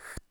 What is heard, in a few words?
slide